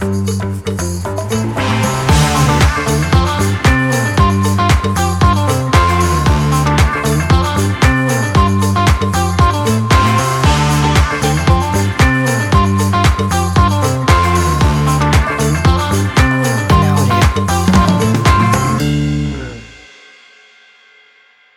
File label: Funk Town; Logo Version 3